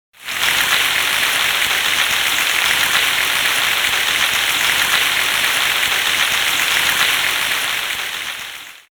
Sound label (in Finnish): aplodit